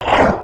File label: hit, creatures organic